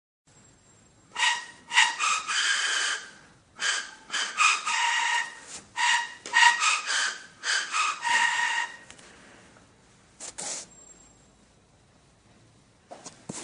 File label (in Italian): flauto di pan